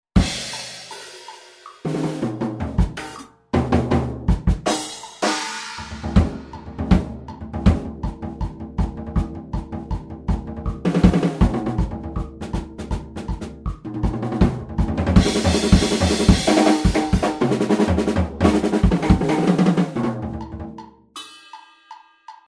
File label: Drumset Etude